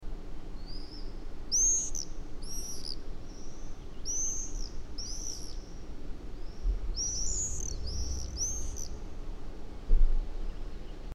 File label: apuapu calls; Tervapääsky (lentoääni); Tyypillisessä loivasti kaartuvassa lentoäänessä loppuosa ei korostu kuten aasiankiitäjällä; Ruokolahti, heinäkuu 2024